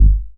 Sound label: Hard Blow II, baesse